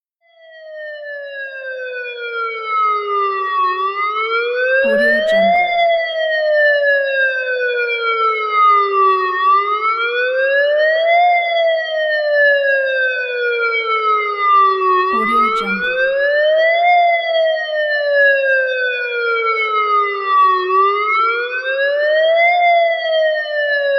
ambulance siren

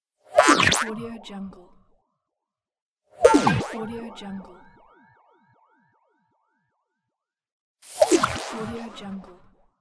zap cartoon

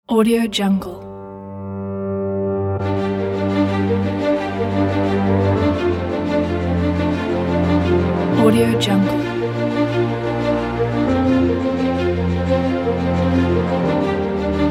historical documentary

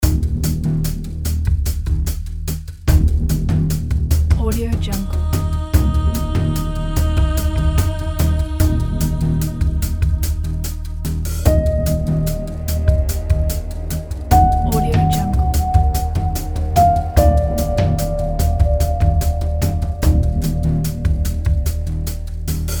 cinematic suspense trailer thriller music

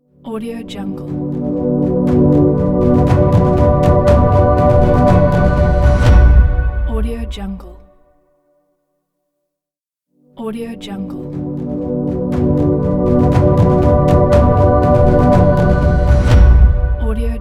cinematic logo

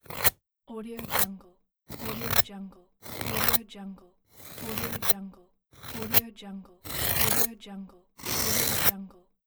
scrape off ice

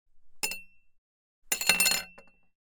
cheese knife

